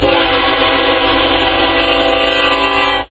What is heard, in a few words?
horn